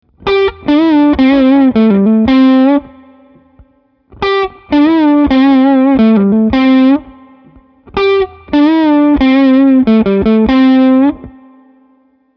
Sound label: Pentatonic Lick Minor